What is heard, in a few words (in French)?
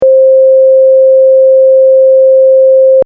528 sin